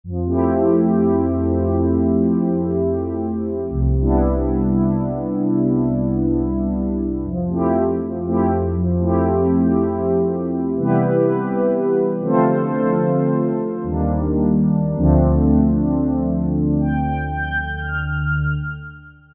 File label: Neo Warm Pad; Factory Voices